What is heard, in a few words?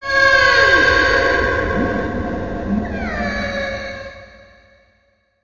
Wind die; WindNepilim